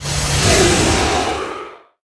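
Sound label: skill leech magic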